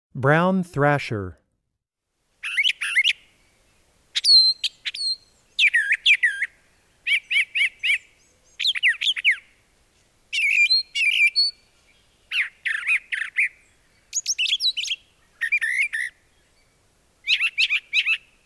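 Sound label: Brown Thrasher, Wildlife Sounds; Animals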